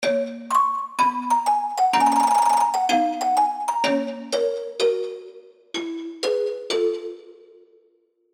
Empty Bottle, KEYS; Serum

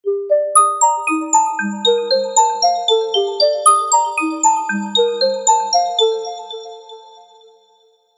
Waltz

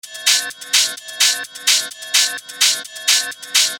128 BPM

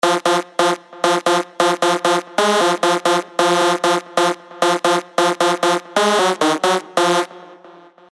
F (134BPM)

